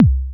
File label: BD, Drums Dance & Groove; USB Soundscan vol.11; AKAI; ALL BD